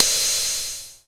Ride, Dubstep One-Shot Drums

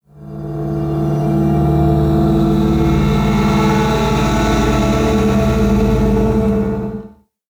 LONG FX; Loopmasters; Keith Mackenzie & Fixx Present Booty Breaks Vol.2

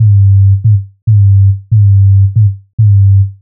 140 F BASSLINE, Dubstep

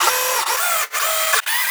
140 FX LOOPS

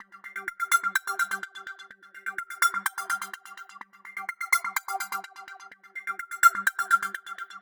126 G MUSIC; funky; Loopmasters; Funky Underground Vol 2

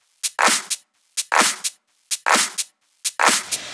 Loop, 128 BPM

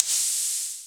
Hat, Dubstep One-Shot Drums

